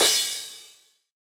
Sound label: Crash, Yamaha DJX 2B